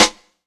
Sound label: SNARE BURN